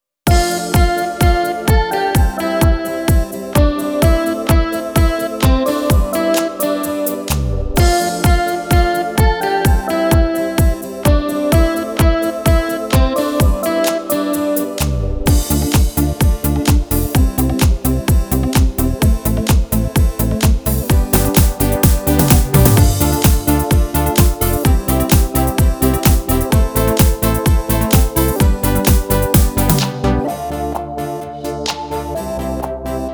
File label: Metro Beat